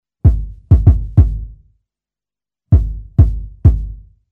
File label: Bass Dr Sound bleed